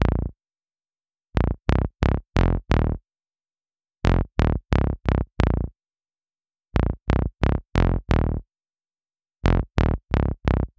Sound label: W-Coast HipHop Synthbass Bbmin; Digidesign; Pro Tools Essential Loops